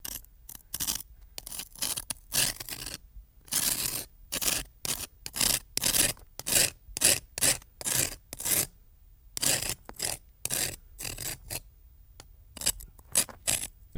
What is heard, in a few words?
Knife Scraping On; knives